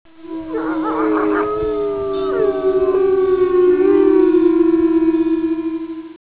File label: wolves pack howl